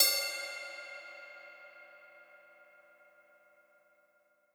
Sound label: Crash Bliss; Percussion; Cymatics - Infinity Beta Pack 2.0; Zeus Percussion Collection